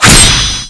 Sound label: ONSLAUGHT KNIFE ATT; smashing